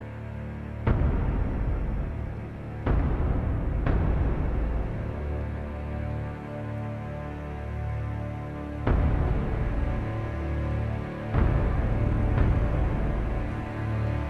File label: Ship Loop; Rise of the Argonauts; ps3 windows xbox 360; Tartarus Argo